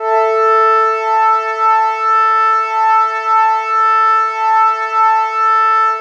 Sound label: Dream Pad 5th; Keys; Pads; Drum & Bass